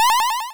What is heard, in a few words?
powerup, Retro Sounds 32-Bit